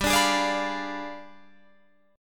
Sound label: G 7th Flat 9th G, Guitar Drop D